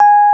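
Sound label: EP G#4 MP, electric piano; Greytsounds VOL-1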